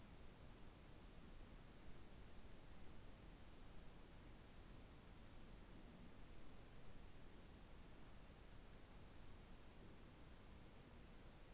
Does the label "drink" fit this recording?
No